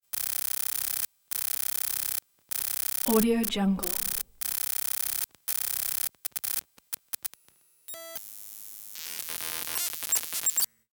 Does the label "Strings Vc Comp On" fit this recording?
No